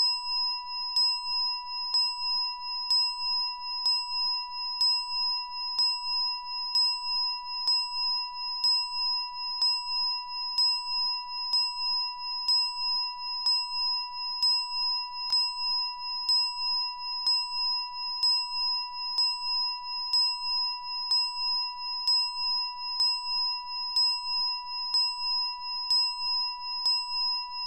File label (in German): Klangschale lang